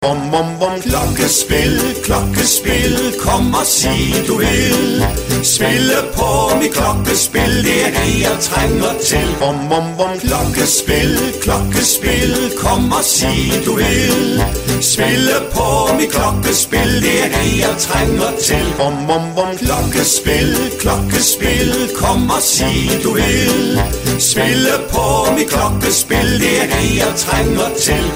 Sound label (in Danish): Klokkespil Dansk; Klokkespil Dansk 2